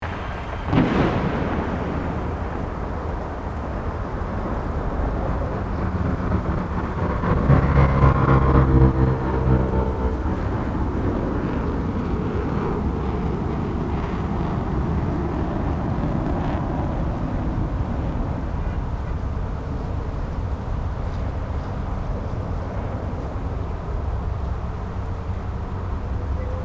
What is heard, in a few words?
Alien city atmosphere; Sci-Fi Ambience; futuristic world